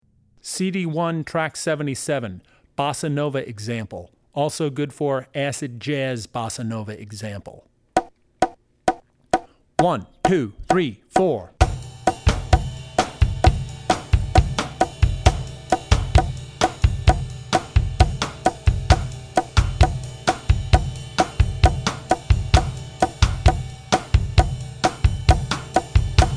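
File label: Bossa Nova, Drum Tracks; Drummer's Bible Tracks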